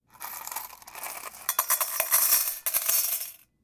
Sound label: Dropping Coins Into Stainless Steel Cup